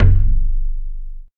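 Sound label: LOG drum; East West - Phat & Phunky CD2; 90's Sample CDs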